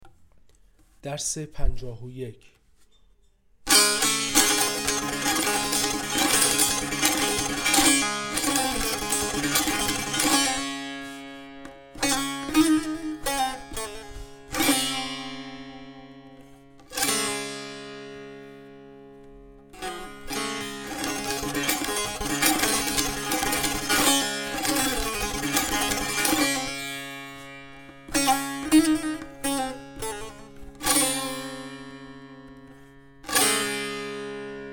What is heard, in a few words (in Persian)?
مشق تنبور